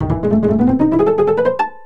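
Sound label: pizzicato; AWE64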